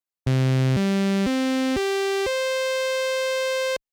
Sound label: special sound